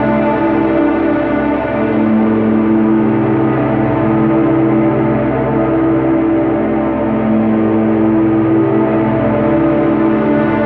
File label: Ambient Melody; Drums & Drones